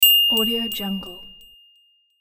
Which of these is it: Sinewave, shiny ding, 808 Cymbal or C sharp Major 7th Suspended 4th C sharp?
shiny ding